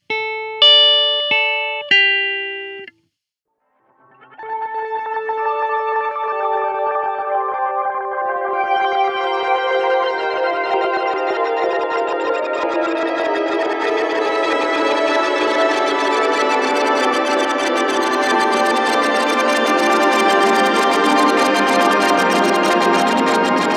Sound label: Filtered Pitch Delay; meris polymoon